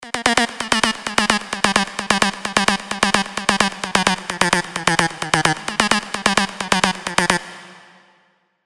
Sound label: EDM; Nexus 3